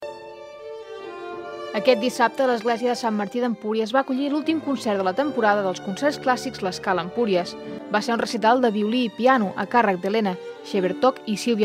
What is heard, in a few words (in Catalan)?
concert de violí i piano